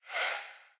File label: Ghost; Peace, Death; Fatecard